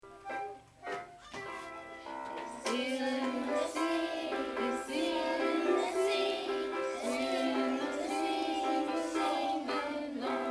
Seal in the sea

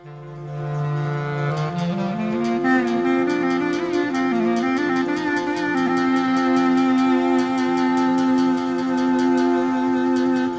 klarino

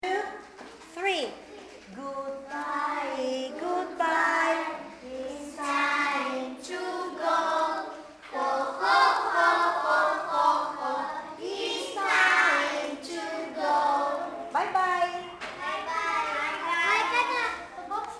Goodbye

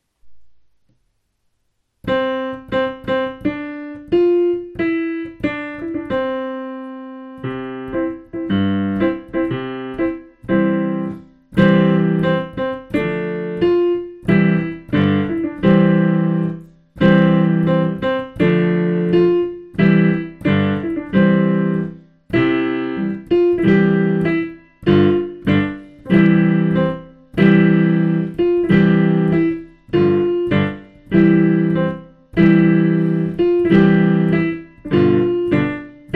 piano; vlepo karavia

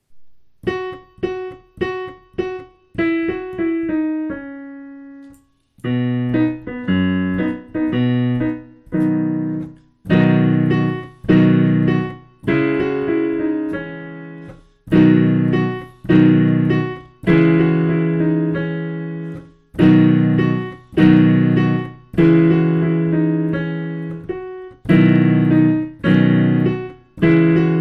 karavaki piano; ela

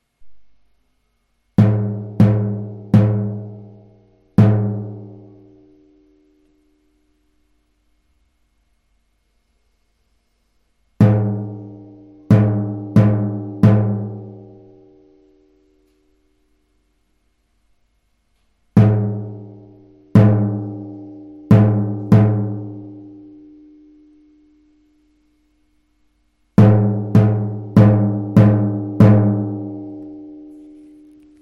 ta titi drum

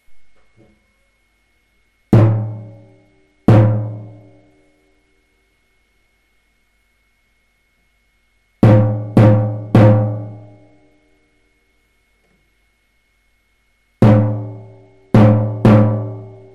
rhythms drum; tetradio

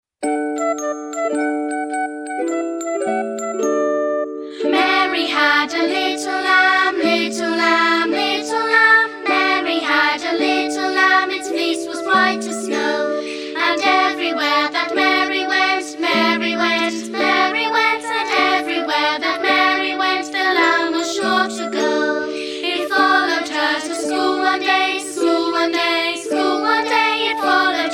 Mary Had A Little Lamb song

